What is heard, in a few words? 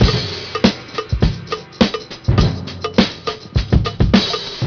jungle jazz, funky drum loops